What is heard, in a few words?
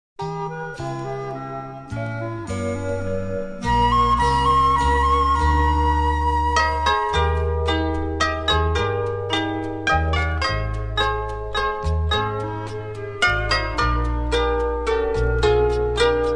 Harp instrumental with Orch